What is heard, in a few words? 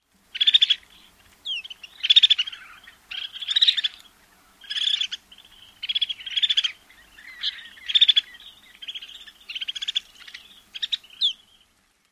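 winter flock calls, Snow Bunting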